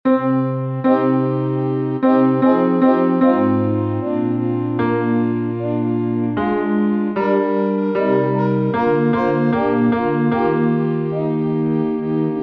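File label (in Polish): tenor z chórem mój panie jestem twój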